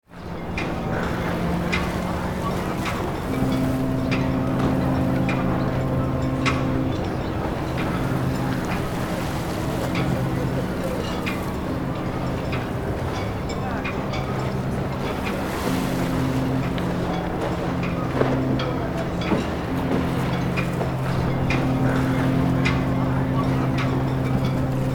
Seaside harbor ambience loop; ship

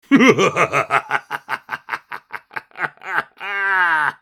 Old man sinister evil laugh

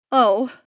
Surprised female reaction

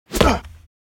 Strong tennis serve male player grunt

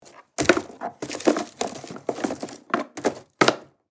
Picnic wicker basket packing food

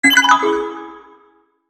Tech alert tone digital futuristic

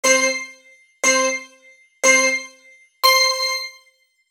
Racing game start countdown; mobile game

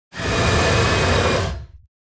Lizard creature aggressive attack

